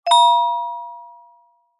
alert chime ping med pitched

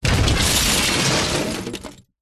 impacts trash junk huge crash various materials

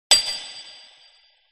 CINEMATIC HIT High Metal Click; smartsound

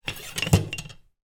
household kitchen knife slide into holder

